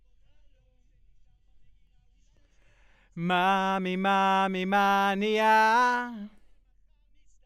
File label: B. Vox